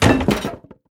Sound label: Crash Objects Small Wood; gamerip; Car Simulator 2; android